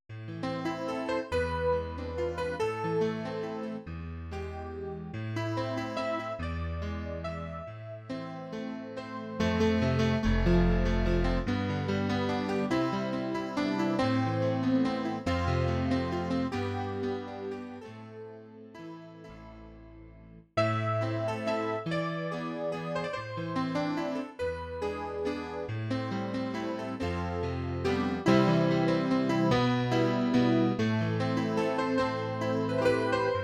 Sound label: piano